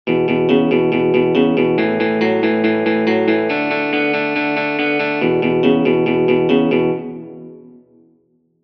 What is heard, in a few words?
rocknroll